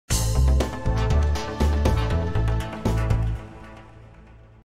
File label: radio jingle